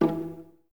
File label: Violas E 3 Pizz; Miroslav Vitous; String Ensembles